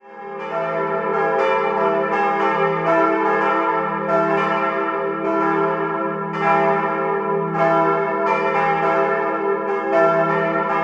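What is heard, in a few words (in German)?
grundtöniger Glockenklang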